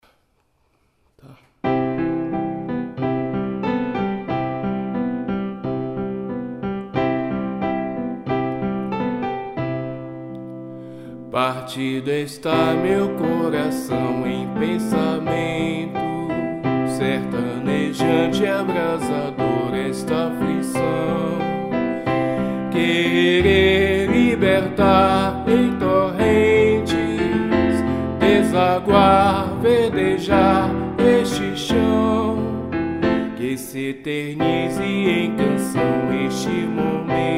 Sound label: blues